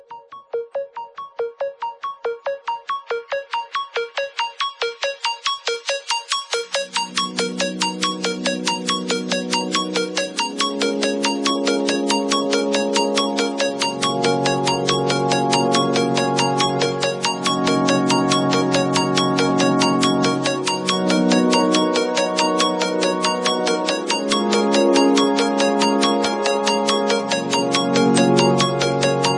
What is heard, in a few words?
High Volume Ringtone; ringtones